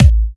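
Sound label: Bassdrum, right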